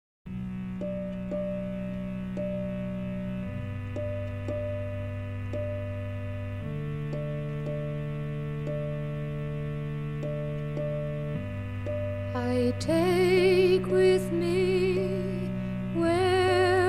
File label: Old Welsh Song; Henry Treece